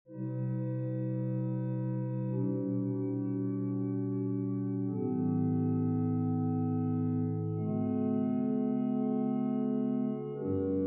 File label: bourdon, hauptwerk